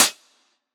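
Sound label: Closed Hat, Synthwave; Drum Samples; BaseBlock; Futurism; Synthwave Loops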